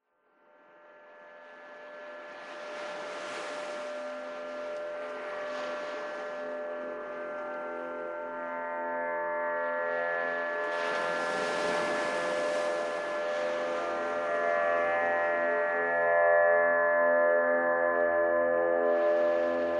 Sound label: windharp